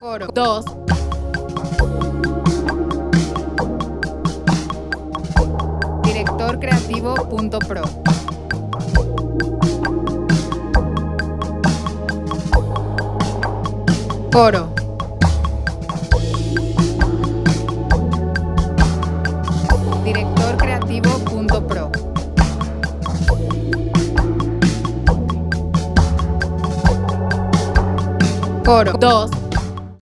Bb 134 4 4

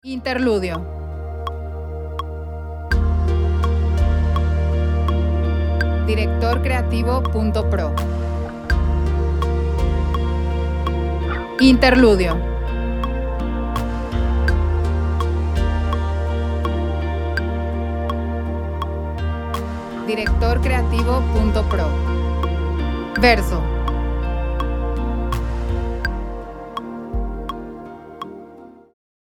Bb 83 4 4

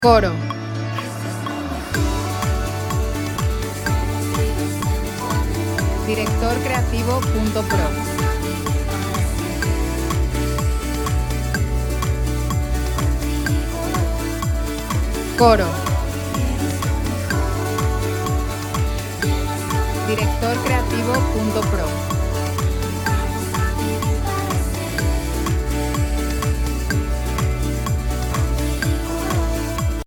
125bpm 4/4 D

